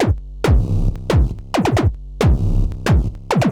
Noise Kick 136, horrorscape samples 136bpm

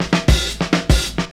Fills, Roland; Sound & Vision - Gigapack I; Perc

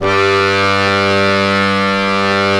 ACCORDION G2; USB Soundscan vol.63; Balkanish Traditions; AKAI

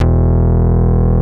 MINIMOOG; Greytsounds VOL-1

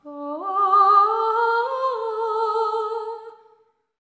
LAMENT; Spectrasonics; Symphony of Voices; LAMENTING

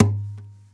KNOCK, Nylon Guitar

